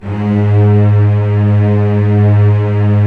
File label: STR, STRING; Roland - LA Composer vol. 1